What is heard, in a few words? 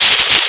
Glitch; blue archive